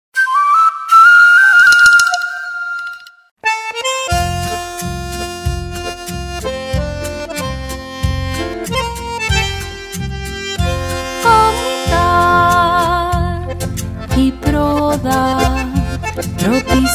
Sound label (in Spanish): Chotis Canto